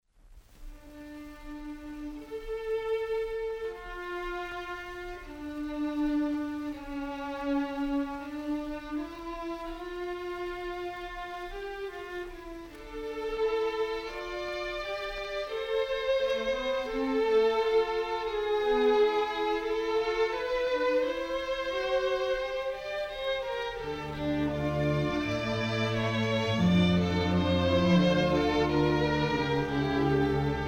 Fugue simple; BACH; LART DE LA FUGUE; BWV 1080

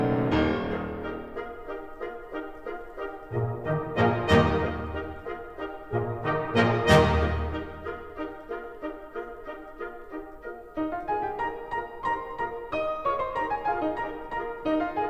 piano concerto in E flat major

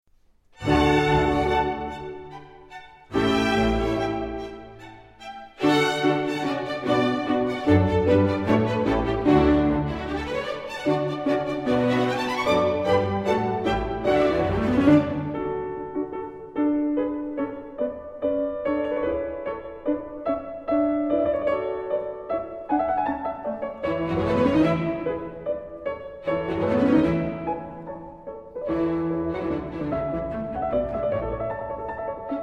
Piano Concert Allegretto; Bach CPE

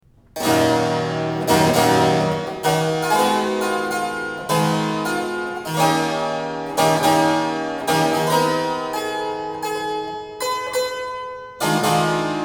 Keyboard Sonatas

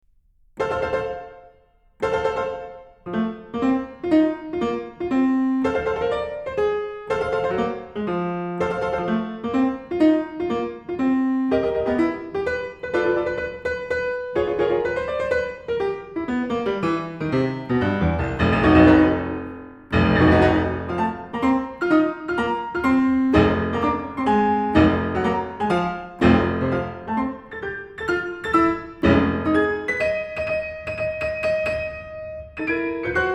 Piano Sonata in F major; Piano Sonata No. 2

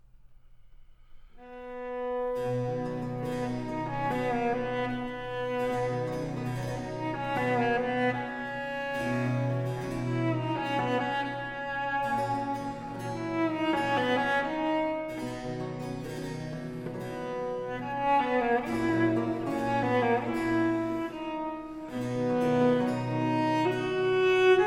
Cello Concerto in A minor; Vivaldi; Cello Concertos; RV 419

